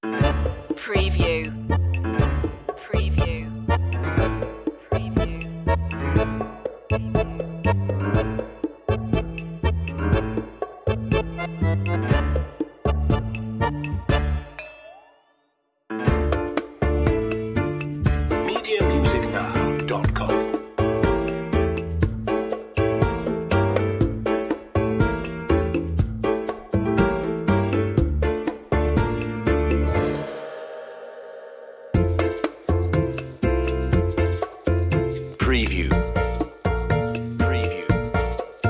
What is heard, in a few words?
tango